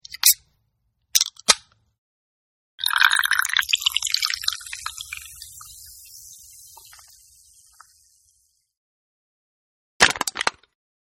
Sodacan, Man Opens Up Sodacan, Pours Soda Out And Then Smashes The Can; Metal (Sound Effects:Materials)